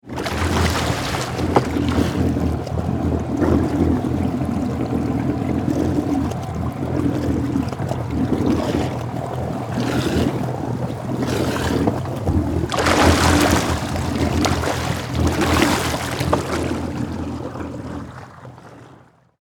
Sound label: Vehicle Jet Ski Engine Bubbles Shallow Water; Boats; Vehicles